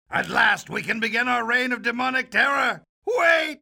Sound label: demonic terror